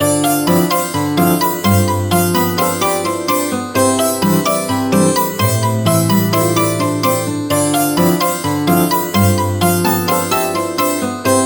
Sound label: September; Huawei Honor 3